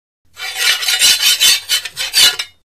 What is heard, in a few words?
Swords; Broad Swords Rubbing.